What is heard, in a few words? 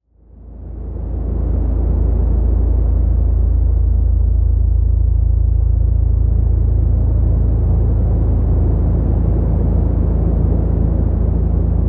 offshore ambient